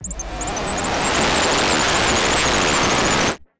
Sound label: Misc Noise; layering